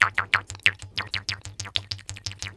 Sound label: KHOMUS